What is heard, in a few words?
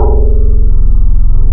PPG BASS, wavetables; Classic Synths